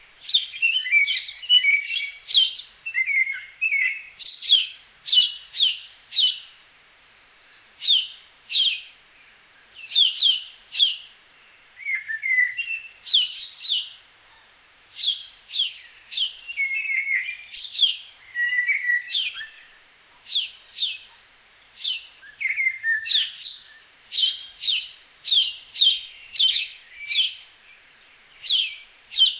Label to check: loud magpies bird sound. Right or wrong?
Right